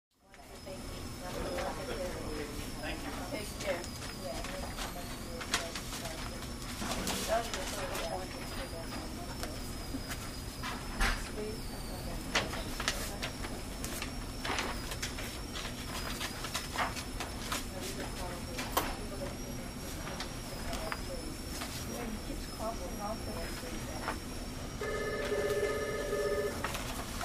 Dentist Office: Reception Area Walla, With Light Drilling In Background.